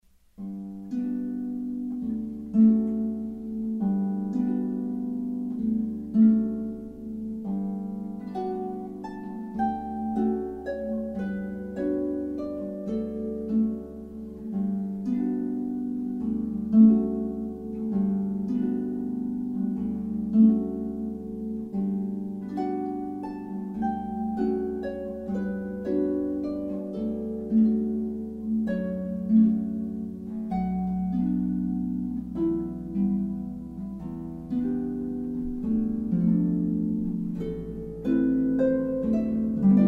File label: harp solo; gymnopedie 1